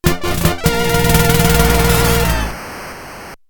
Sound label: Fanfare; Xak1 OST